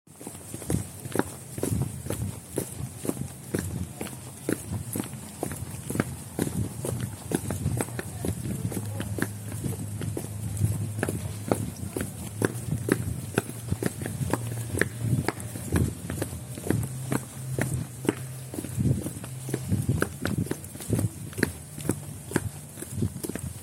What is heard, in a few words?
footsteps; Rotterdam Field Recordings